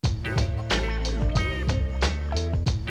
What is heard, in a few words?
93BPM; InVision Interactive; 40 Oz Phat Slammin